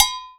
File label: Perc Agogo Low; Forzee